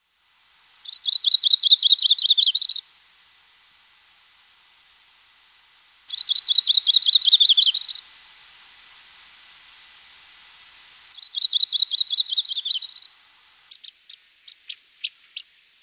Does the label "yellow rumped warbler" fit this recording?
Yes